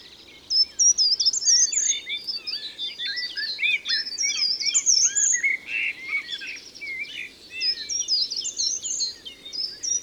Grimpereau des jardins, Concerts naturels de l'Isere; Studio Les Trois Becs

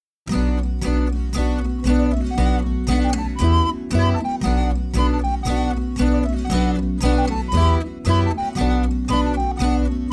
Version instrumentale

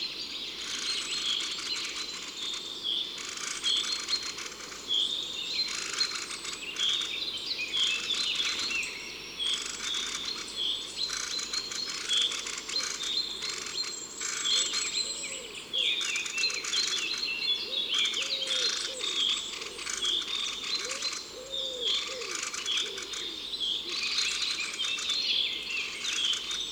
Grive draine cris; Drome; Concerts Naturels de la Drome; Studio les Trois Becs

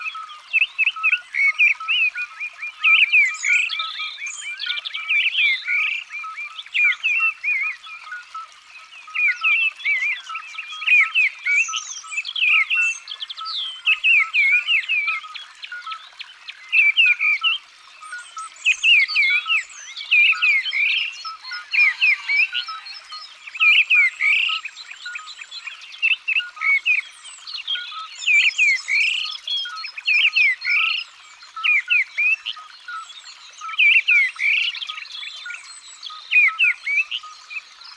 Grive draine; abc de la nature

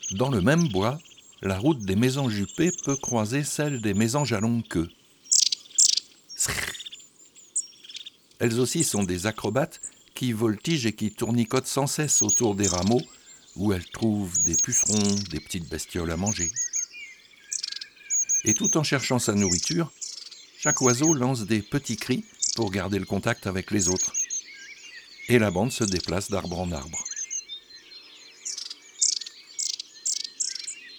Automne La Mesange a longue queue, chants d'oiseaux

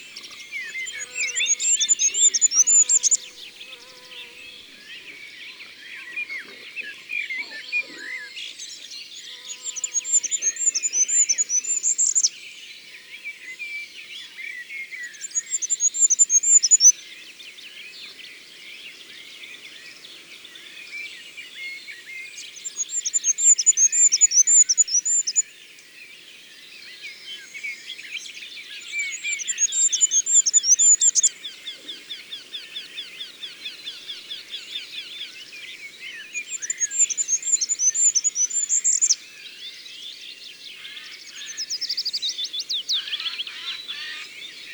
Roitelet huppe; ABC de la nature

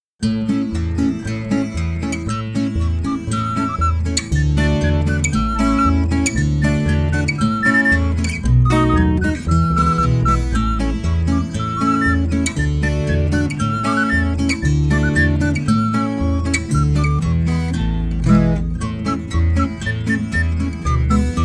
Le herisson Version instrumentale

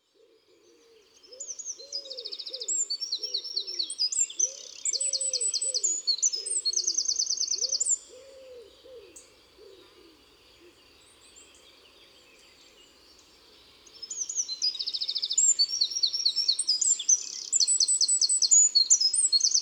Pigeon ramier et troglodyte mignon Chants d oiseaux des bois et des forets

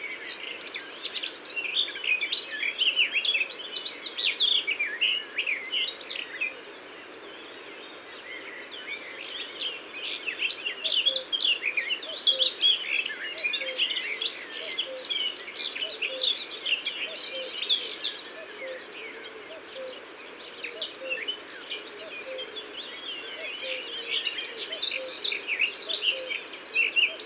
Fauvette des jardins, chants d oiseaux; ABC des chants d'oiseaux

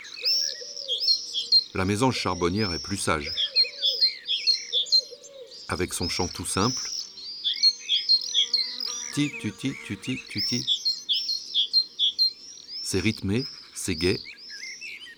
Mesange charbonniere, chants d'oiseaux; ABC des chants d'oiseaux

